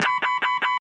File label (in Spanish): alarma